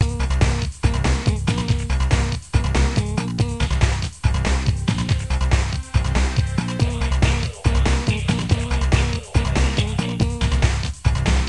gamemusic; TankDefender

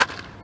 ball; baseball bash

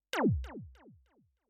laser; drawing space

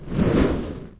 fire; soldiers combat

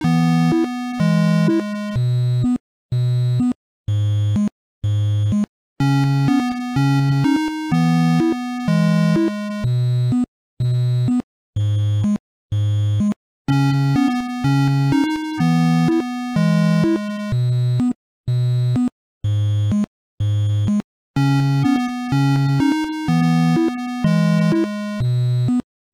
song; ropefall3

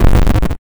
bigexplosion; boss level shootout

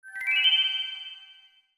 glitter; bffs dark academia winter outfits

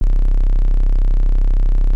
noise loop; desert run

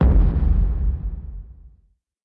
game over; destroy boxes2

